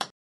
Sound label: putter golf ball